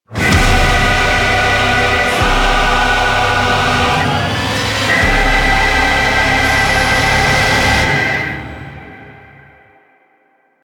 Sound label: heavy transition; Doom